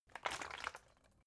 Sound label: bottle water big shake single soft; gastronomie; berufe